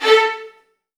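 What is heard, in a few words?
VN FX A2 left; ILIO; Kirk Hunter Virtuoso Strings VOL-1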